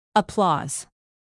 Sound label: applause